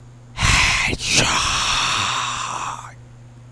Headshot

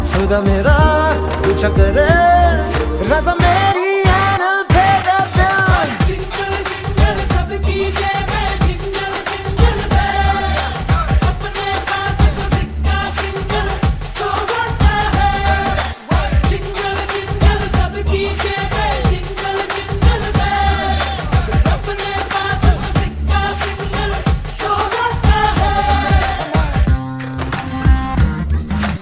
Jingle

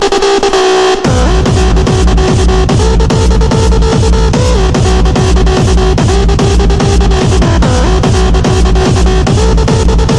Hardstyle

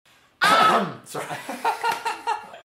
Cough Cough

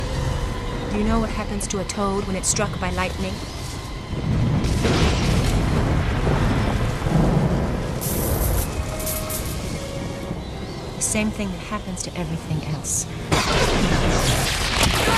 Storm Toad

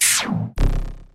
Email Delivery

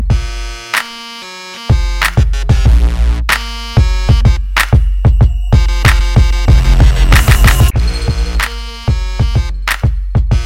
Hip Hop Bass

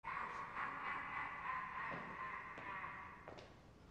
Goblin laugh

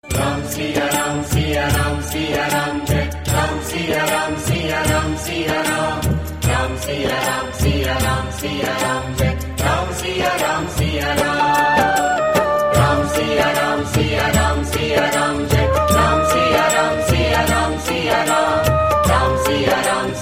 Ram Bhajan

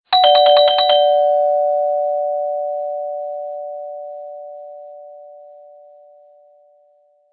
Door Bell

